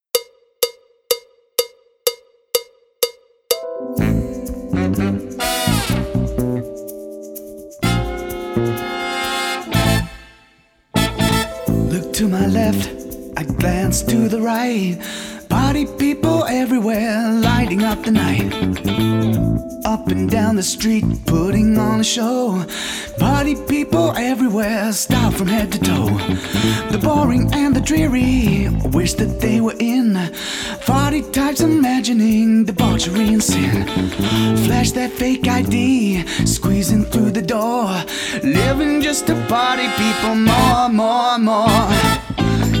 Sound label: backing track without click